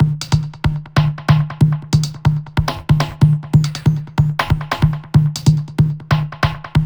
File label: Loops 140 BPM Hihat Percussion Loops